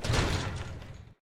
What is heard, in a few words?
Garage Door Shut; Lethal Company